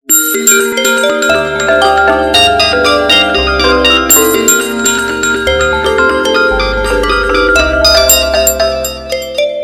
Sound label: Oppo Reno6 Top Tune